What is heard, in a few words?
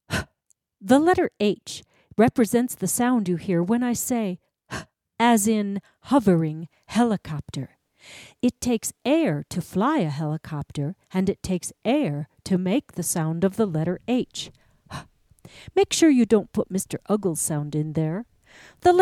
Bell Tree